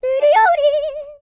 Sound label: YODEL; ILIO; Vocal Planet